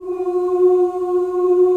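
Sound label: G3 FEMALE CHOIR, OOS; Northstar - New Gold VOL-2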